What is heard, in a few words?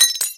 glass fall